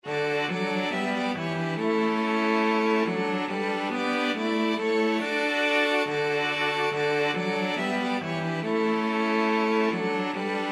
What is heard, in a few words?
string quartet; ding dong merrily